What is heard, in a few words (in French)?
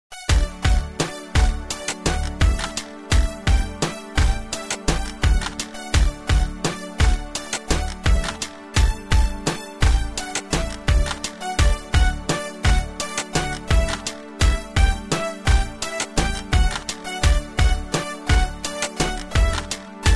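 Mon nom a moi (instrumental); chants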